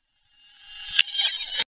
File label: Sword Sound; FXHome; Fighting Sounds